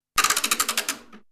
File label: lotto wheel, NÖJESFÄLT LOTTERIHJUL